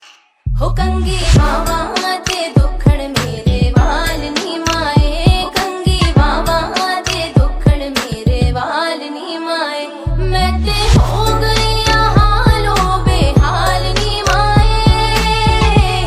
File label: ringtone folk vibes of punjab punjabi songs ringtone